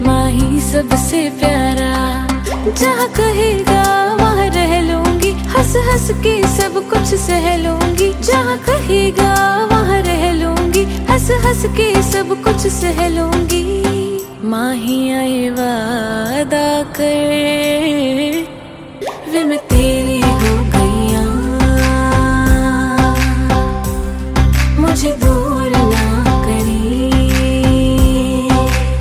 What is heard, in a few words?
hindi song ringtone, bollywood ringtones